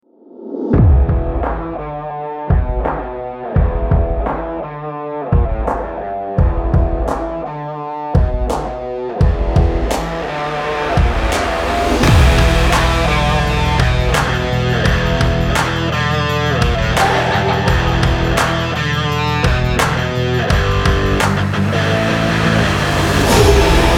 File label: Action Sport Rock Trailer; energy action videos with cars, sports, workout